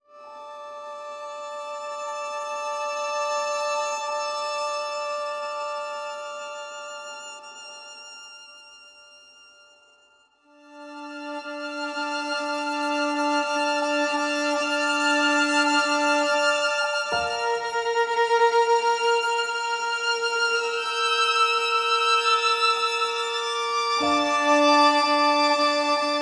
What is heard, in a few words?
Violin Reverie; intimate violin